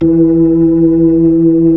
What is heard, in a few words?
B-3, Keyboards of The 60's and 70's; Club Xfade